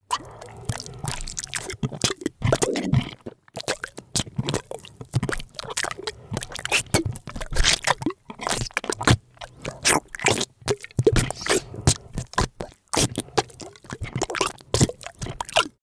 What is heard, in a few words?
monster flesh eating; koerpergeraeusche; comedy-cartoon; soundpool